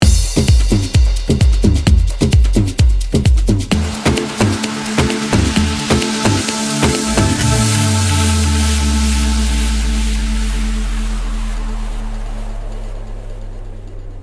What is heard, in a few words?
Spanish 130 BPM